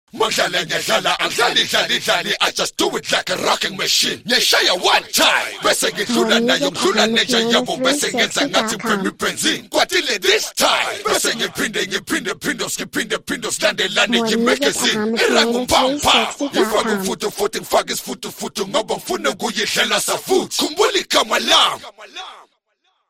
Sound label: kwaito